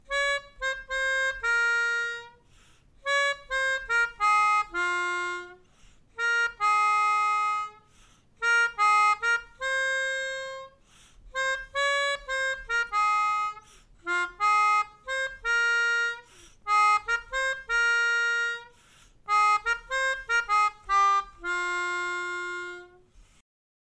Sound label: Melodica